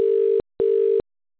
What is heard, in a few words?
Ring Back, IP Phones; Cisco; IOS; 7942G; English